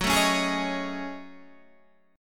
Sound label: F sharp Minor 6th Add 9th, Guitar, Standard; strummed; JGuitar